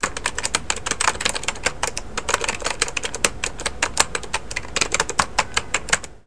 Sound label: keyboard